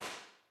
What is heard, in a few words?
U87, impulse responses; Henry Olonga; The Beautiful Room